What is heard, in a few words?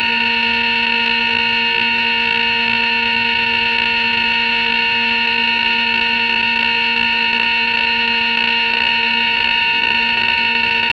separated stacatto radio